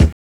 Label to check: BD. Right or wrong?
Right